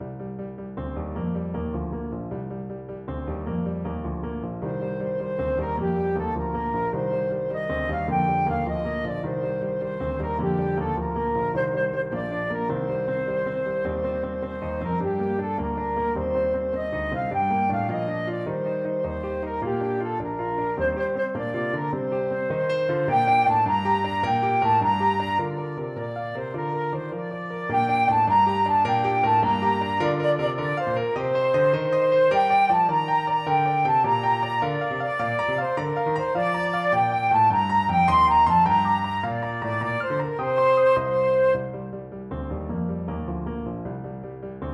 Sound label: follow me to carlow, flute